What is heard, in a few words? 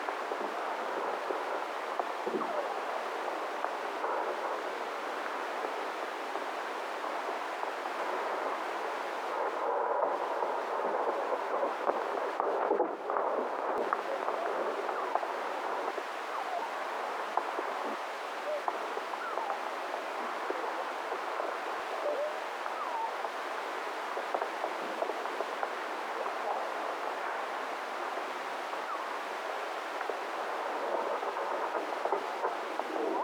vocalization filtered